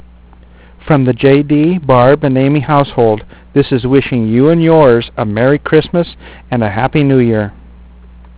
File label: xmas